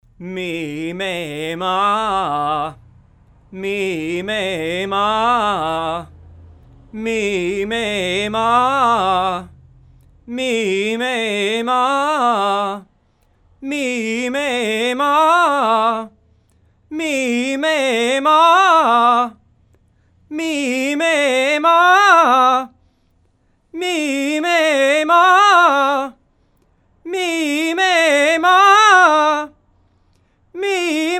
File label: Vocals scale